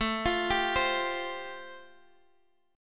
A Minor 7th Sharp 5th A, Guitar, Standard; arpeggiated; x,x,7,10,8,8; capo=2

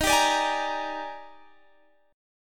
Eb Major 11th, Guitar, Standard; EbM11; strummed; JGuitar

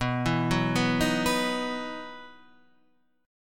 E Major 7th Suspended 2nd

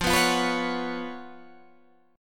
Db 11th F, guitar

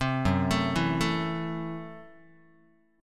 F sharp Minor 7th Flat 5th F sharp Guitar; arpeggiated; JGuitar

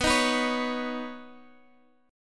B Major Add 9th, Guitar Drop D chord

